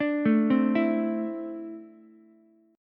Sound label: D 7th Suspended 2nd down Ukulele; D7sus2 arpeggiated